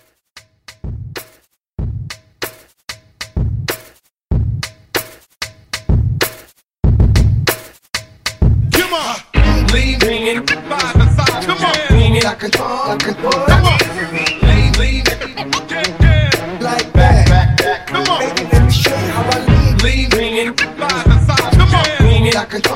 Scratch Hype

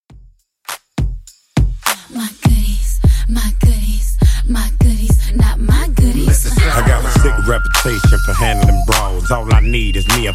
Rnb DJ Friendly Redrum 102bpm

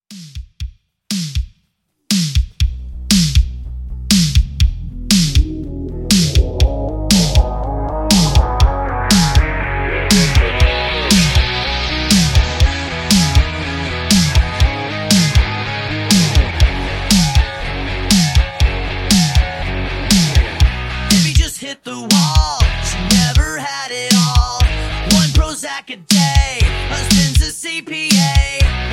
Rock Re Drum 120

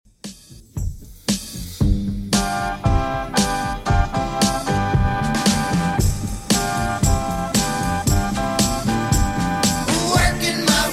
60s 115bpm; Redrum

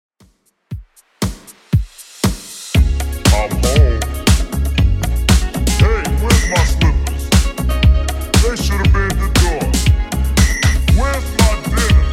80s Redrum 118bpm

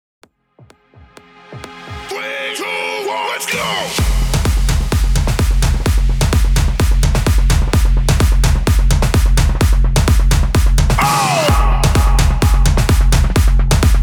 128 BPM

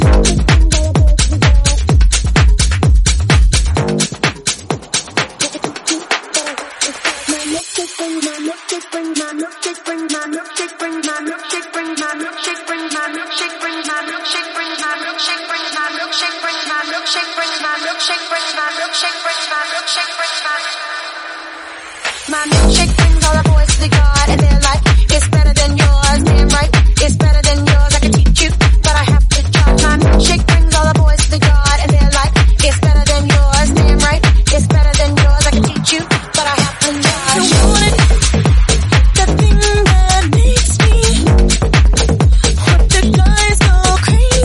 Tech House 128bpm; remixplanet

